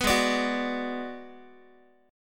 Ab Minor 7th Flat 5th Ab, down, Guitar, 4A, 4E, 5A, 5D, 5G sharp, 6C sharp; strummed; JGuitar